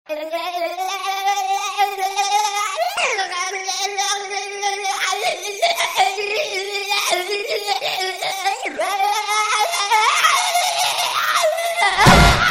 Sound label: frog in a mixer